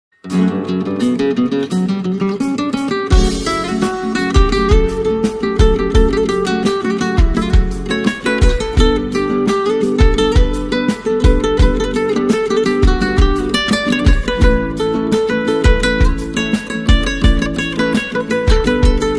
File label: guitar